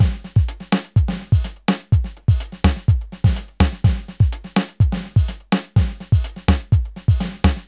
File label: 125 beat basic, Nu Jazz House; Nova; PowerFX